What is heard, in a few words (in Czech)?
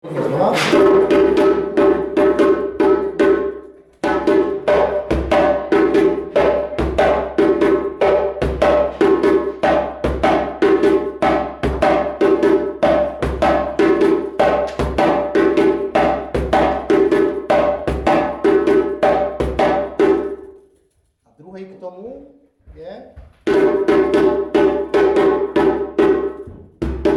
Bubny, djembe